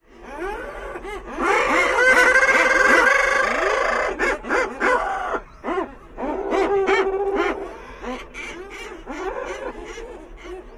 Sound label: colony sounds